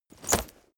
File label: leather metal impact hard